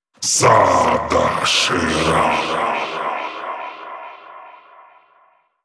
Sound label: 138 Shamanic Voice (Wet), Psytrance